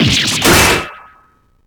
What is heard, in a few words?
surprised